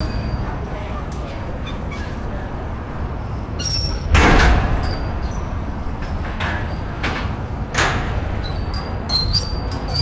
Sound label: metallic pedestrian gate opening and closing; trains